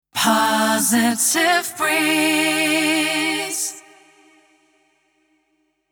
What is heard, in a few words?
Sung Jingle Positive Breeze Eb Min 116bpm Acapella